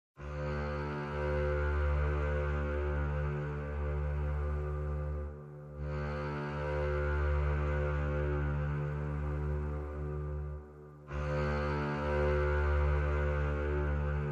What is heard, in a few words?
Strings Only